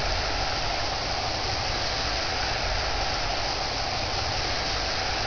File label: steam; co shadow